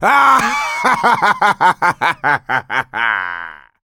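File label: laughter, male; survivor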